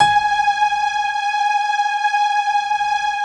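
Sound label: G#4, PIANO CHOIR; Best Service; Advanced Media Trax Modern Composer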